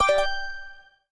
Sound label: small energy